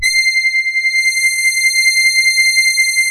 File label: JV GR LEAD, JV-1080; Syntec; Wall of Sounds